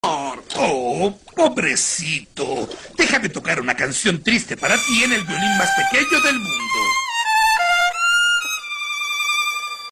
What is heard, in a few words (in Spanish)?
el violin mas pequeno del mundo